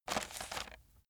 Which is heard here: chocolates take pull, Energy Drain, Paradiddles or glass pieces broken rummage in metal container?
chocolates take pull